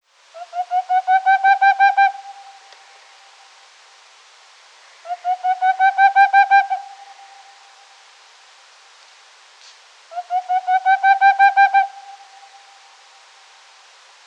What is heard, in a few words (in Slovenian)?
koconogi cuk aegolius funereus; zvoki zivali; pohorka